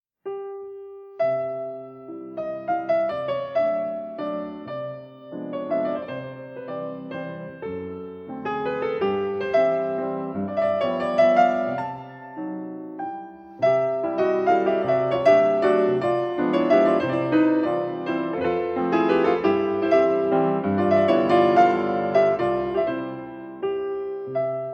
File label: Mazurka in c major, fortepiano; Chopin